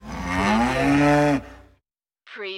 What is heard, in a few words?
Cow Moo Heavy